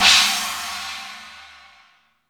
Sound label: WOO HAN, CYMBALS; 90 s Sample CDs; Back In Time Records; Big Bang